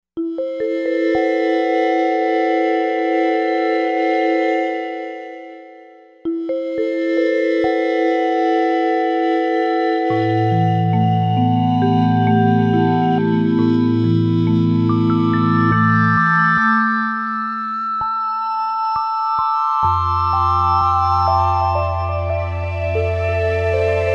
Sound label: Marimba Dream